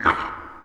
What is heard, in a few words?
Pulp; rabbit eater; toy zombies